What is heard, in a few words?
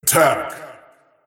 void spirit attack